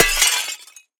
glass impact break; Broken Glass; BluezoneCorp

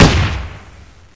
hmg, weapons; hl2

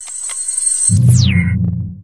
battery out suit; hl2

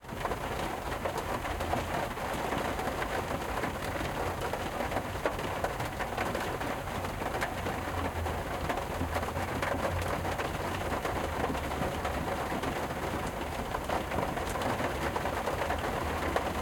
Rain On Auto, Interior Parked, Window Closed; 5.1 LCRLsRsLFE; 2496SoundEffects; Surround The Rain 5.1- Stereo

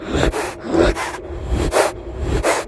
breath, antlion guard; hl2

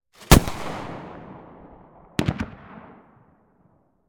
Automatic Cannon - MK44 - Single Shot with Report; Gun; Fascinated Sound; The Gun Locker SFX Pack

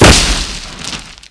land, antlion; hl2

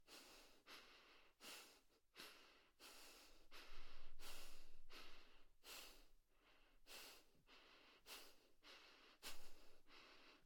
Human, Adult, Female, Panting, Nose, Loop; UberDuo; Character Playset

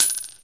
small tink concrete; weapons; hl2

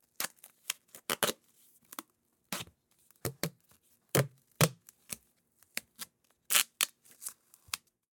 Duct tape; Office Equipment and Supplies

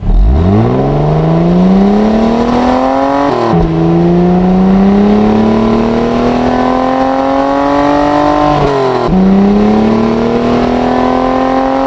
atv throttle on loop, vehicles; hl2

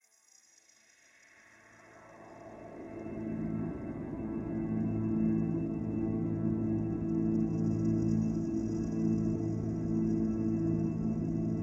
drone; manifest; 3maze; Ultra Drones

